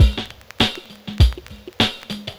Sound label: drloop, drumloops, Vinyl Attack; Masterbits